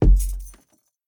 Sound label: Footsteps Wood Walk; The Town